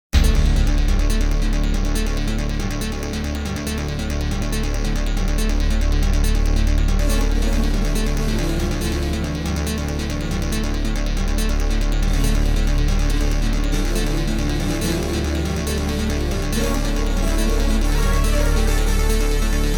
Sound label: Alpha Mega Synth Foundation